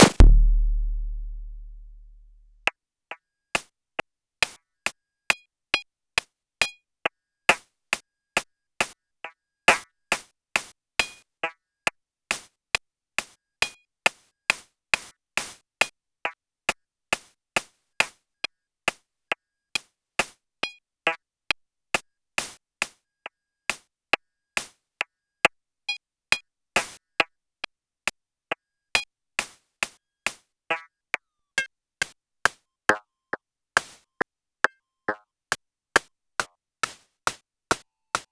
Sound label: Noise Engineering Basimilus Iteritas, Samples Sliced; m8